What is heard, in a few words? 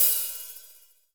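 open hihat, Percussion; Giga Samples Collection; ELQ-DrumQit; rock toms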